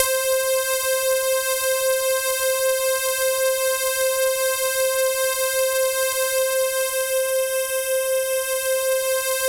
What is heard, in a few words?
C4, Synth Line; 90 s Sample CDs; NiceBeats; SynthLine VOL-14